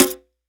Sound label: HiHat; Ghosthack